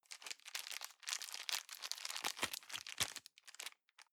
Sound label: plastic bag small rustle long